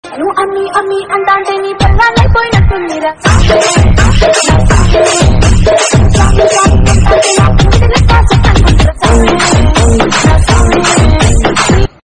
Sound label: ringtone